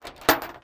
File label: chain link drop, chain link drop on metallic surface